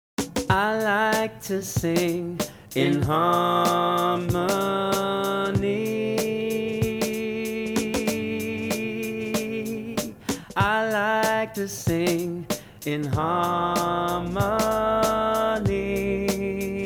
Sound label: Sing in Harmony